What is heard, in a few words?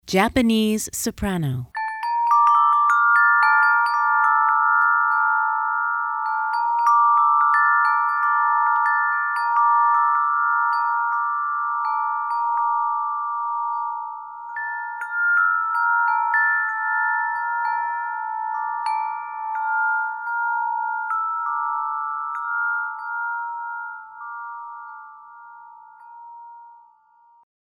Japanese Soprano